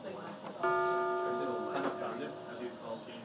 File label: bell